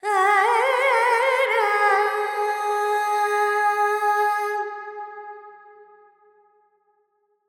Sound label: Carnatic Female Alaps, Lydian, 70 G, Verb; Sample Banks